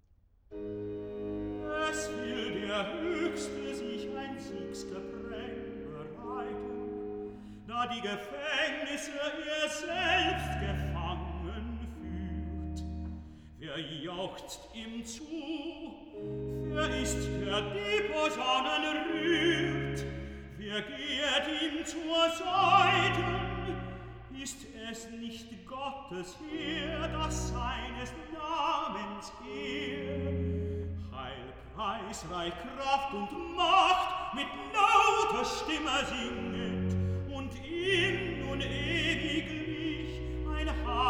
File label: Recitative (tenor); Johann Sebastian Bach; Gott faehret auf mit Jauchzen BWV43; Archiv